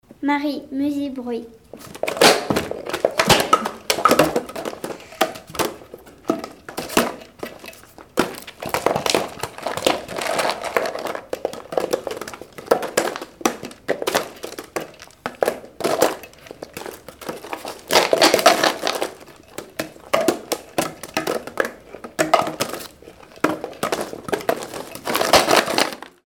musibruit

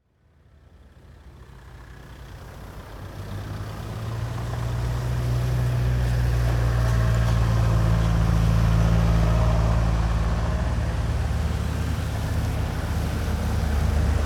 vigilance orange

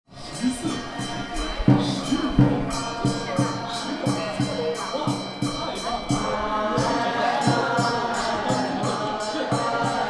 ambiance moines

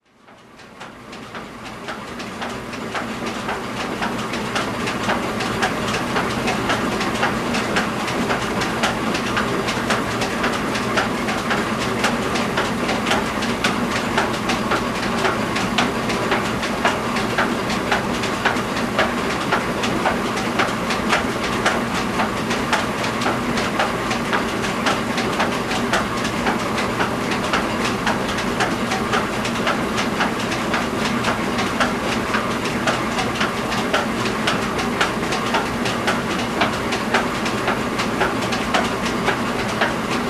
Scierie; MZ-N710; Rosset

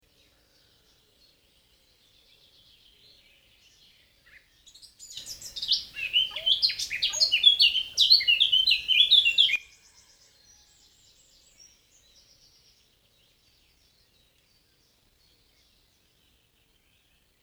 oiseau

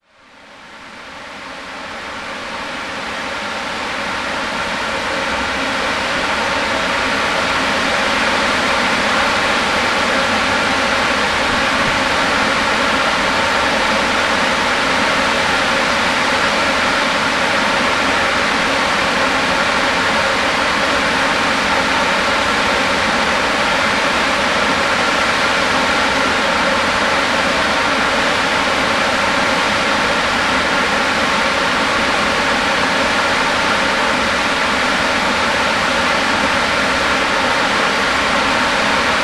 centrale hydroelectrique

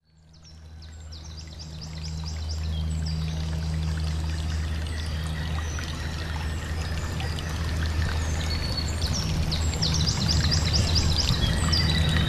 Ruisseau et oiseaux; MZ-N710; les Seilleres

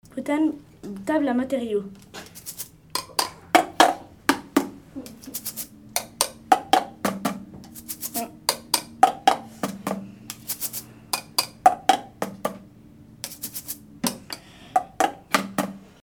table a materiaux

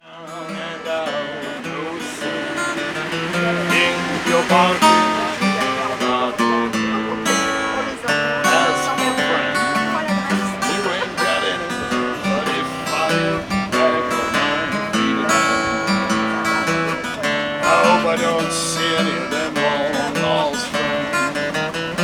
blues metro ligne 6

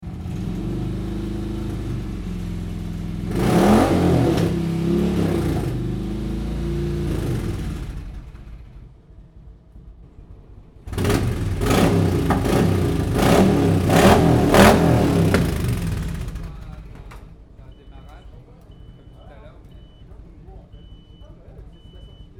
Moto Harley

